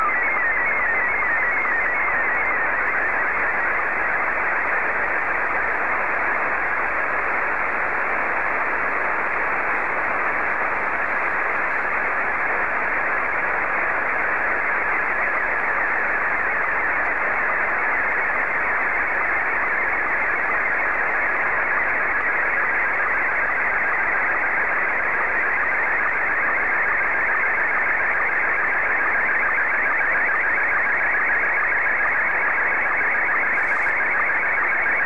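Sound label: Scottie 1, slow-scan television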